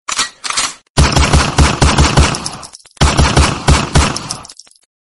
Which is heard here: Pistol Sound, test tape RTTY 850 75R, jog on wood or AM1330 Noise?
Pistol Sound